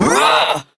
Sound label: Punch, Barks; Kuva Lich; Persona C; Ability